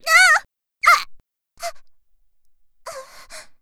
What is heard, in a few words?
die; Eternal Return; Tia; ENG